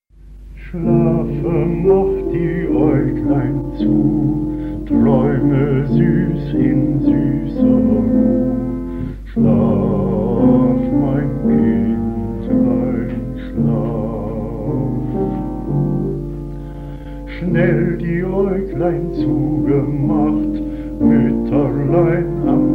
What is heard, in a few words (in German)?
wiegenlied